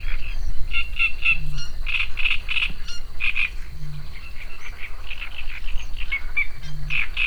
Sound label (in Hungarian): cserregő nádiposzátával, bölömbika; termeszetben